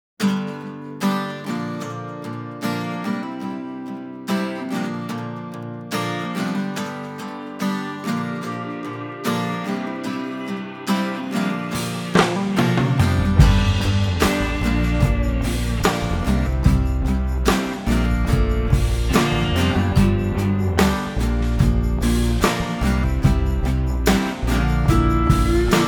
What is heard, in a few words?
Instrumental